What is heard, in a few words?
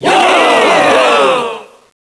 Upset cheers Group Males; Knights